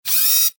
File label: Chain